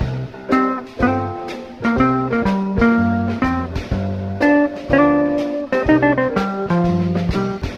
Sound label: guitar and piano, Phonky Loops